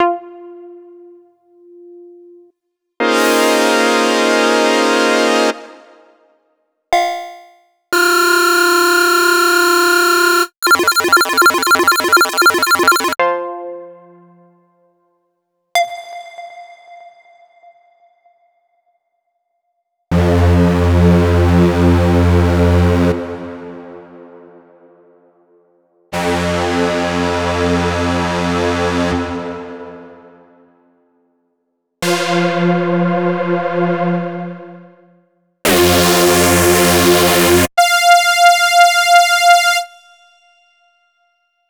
leads

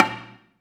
BELA2 A#1, Asian Traditions; USB Soundscan vol.60; AKAI

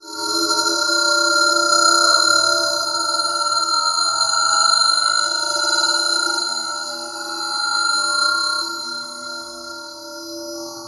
Bowl Guitar; Sonic Foundry (Sony Creative Software); Loop Noir

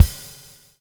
BUBL; Spectrasonics - Backbeat

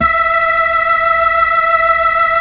B-3, Full Xfade

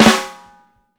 FLAM, TAMA; Sampleheads; New York City Drumworks VOL-1

